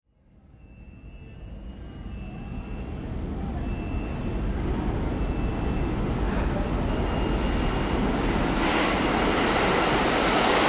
Subway Train Bypass, NYC Subway, Canal Street Station; Subway Train Bypass. Recording from NYC Subway, Canal Street Station, Train passing by station without stopping.; Lynne Music